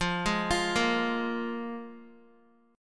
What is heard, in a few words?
F Minor Double Flat 5th, Guitar; Fmbb5 arpeggiated; JGuitar